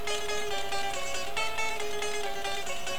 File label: krazzy guitar; cstrike